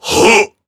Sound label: monster attack